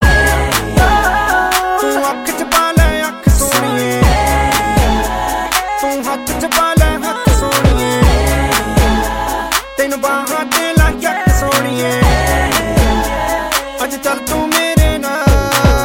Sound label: Bhangra